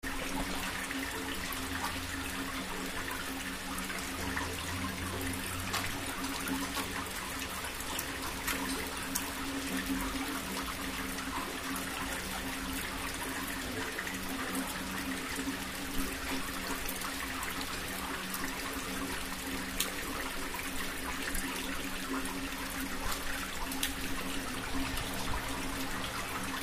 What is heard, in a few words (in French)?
pluie et ruissellement